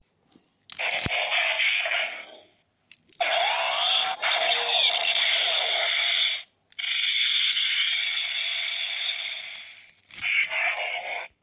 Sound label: Bumblebee Backpack Sounds; DOTM; Mechtech